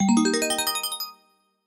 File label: winner